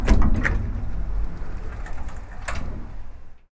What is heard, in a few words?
gear